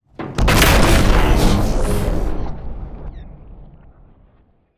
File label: Large Sci-Fi Explosion